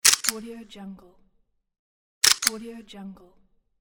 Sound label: camera shot; domestic sounds